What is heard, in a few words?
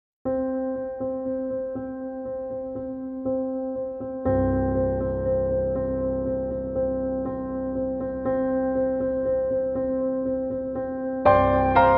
Piano Only